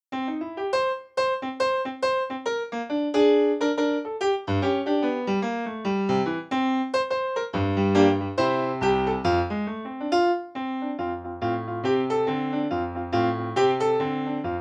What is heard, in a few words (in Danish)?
Bænkebidder Blues